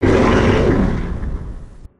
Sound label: cry